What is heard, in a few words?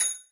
marimba; kurodenwa